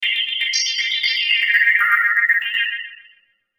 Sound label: Logo Twinkle Warble Mel, Logo Shimmer Melody Wash; Sonic Logo; Blastwave